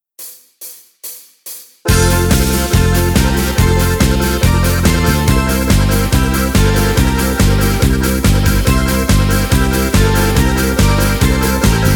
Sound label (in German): Karaoke ohne Harmonika ohne Chor